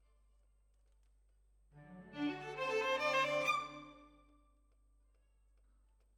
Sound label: Violins, jazz for piano